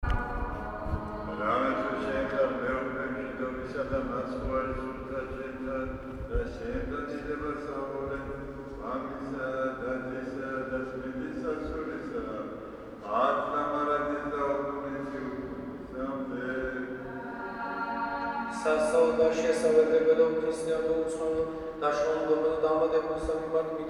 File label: Litergy Sioni church Tbilisi, polyphonic litergies